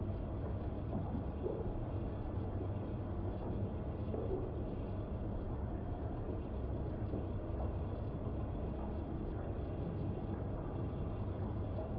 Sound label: amb portal